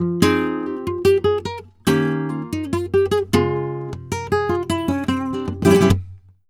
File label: flamenco guitar, tango; Best Service ProSamples vol.46 - Flamenco